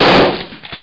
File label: shotgun; Mini Militia